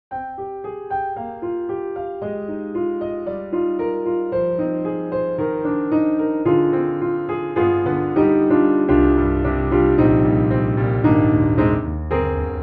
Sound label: Grand Pianos Studio 7ft Softly C7; Synthogy; IvoryII